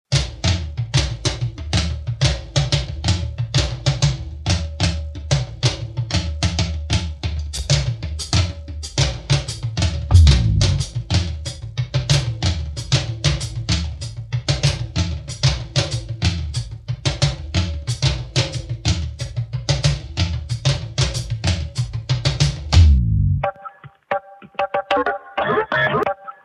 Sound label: drum